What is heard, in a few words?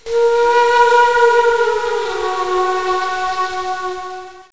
Synth